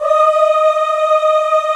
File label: VOX, African Aahs; Roland LCDP11 Africa VOL-1